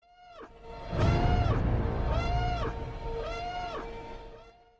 Siren; Rogue One

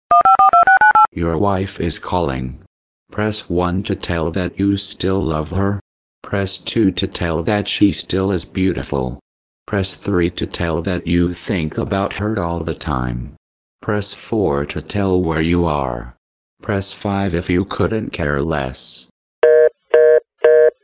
Wife Calling

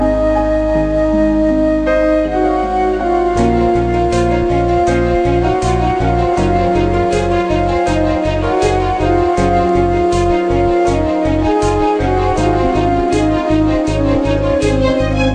Tune

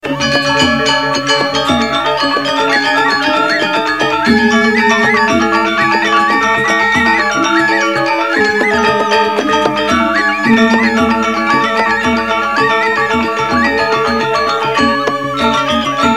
Gamelan Bali

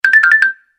Glockenspiel

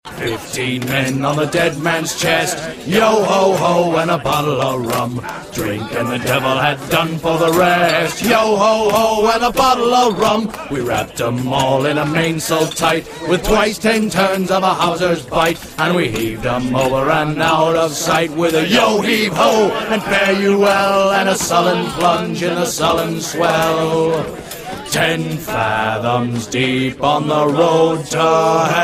Pirate Song

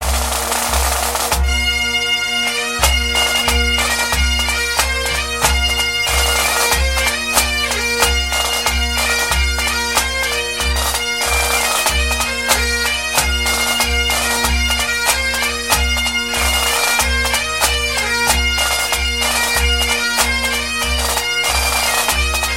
Bagpipes; Black Watch

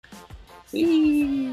Whee

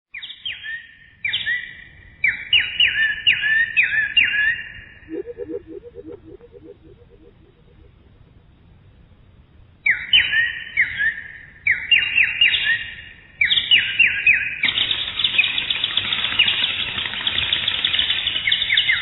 Bird

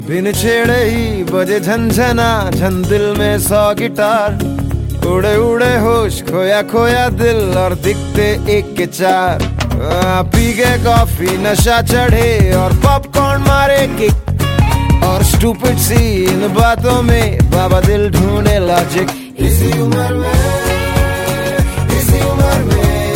Kick

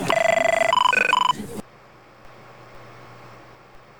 Lucent Desk Phone

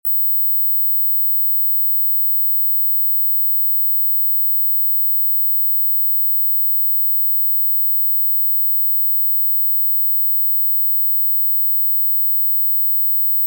16000hz

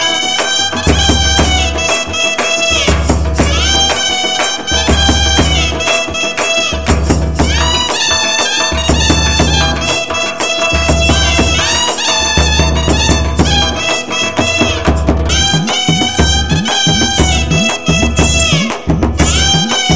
New Beat

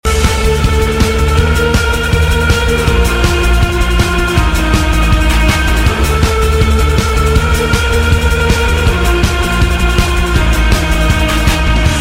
Catchy Tune

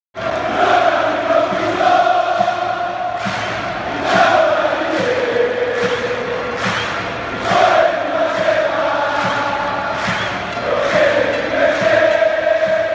napoli chant

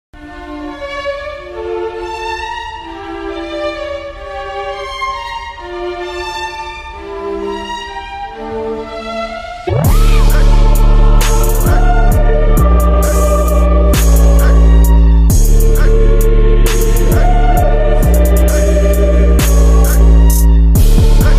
Trap; Watchmen

